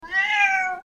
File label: cat